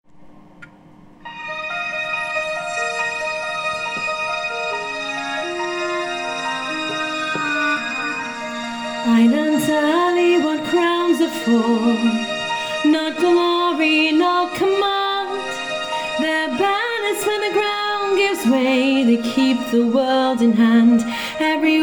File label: Vocal; Auditions